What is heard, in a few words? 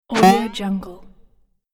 boing jump swoosh